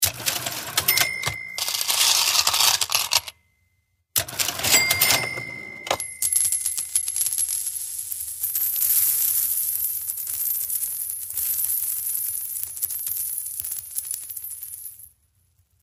som de dinheiro caixa registadora moedas

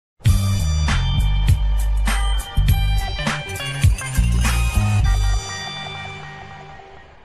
música de missão passada; gta san andreas

